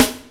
HIT SNARE; Pro-Rec - Synthsonic; TRINITY

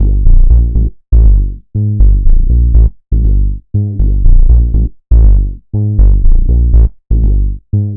Synth Bass

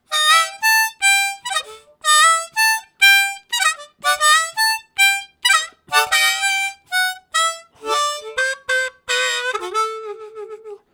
Glissed Off, Harmonica Riffs; Zero G - Harmonica

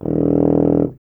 Tuba, Solo Instruments; Miroslav Vitous

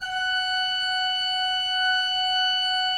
16-8 F5, Cathedral Organ; Propeller Island

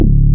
RESO BASS; SAMPLE WAVE MIXING 1

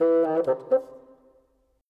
Bassoon, Orchestral; Sonic Foundry (Sony Creative Software); Orchestral VOL-2; Modern 27

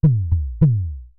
Synare Filter; Star Instruments Synare 3

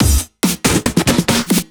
Fills, Loops 140 BPM

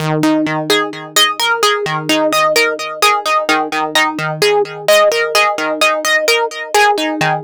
129 Synseq, 129bpm; Zero-G - Soundclash; Dead And Alive

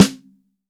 MIX SN, DRY STANDARD SNARE

